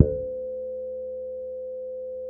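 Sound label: C 5, fretless harmonics, bass; Spectrasonics; Bass Legends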